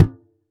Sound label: Surdo Mute, Percussion; Roland BK-5